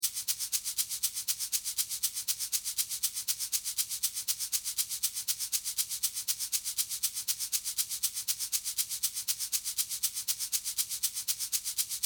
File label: shaker